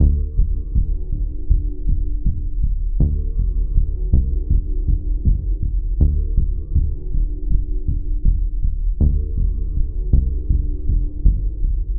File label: Bass Loop 80 Low Pulse D Minor; Ghosthack